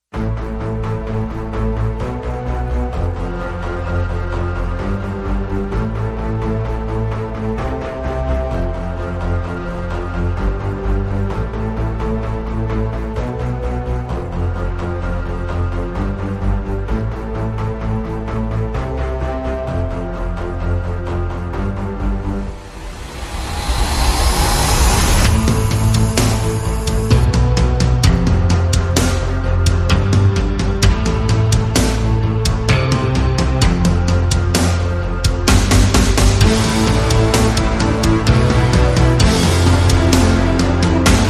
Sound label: No Guitar